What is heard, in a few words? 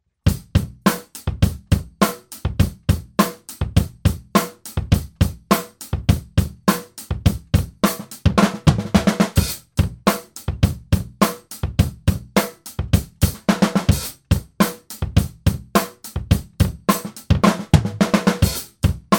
traps drums